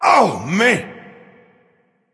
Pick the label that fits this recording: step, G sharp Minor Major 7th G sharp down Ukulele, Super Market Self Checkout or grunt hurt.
grunt hurt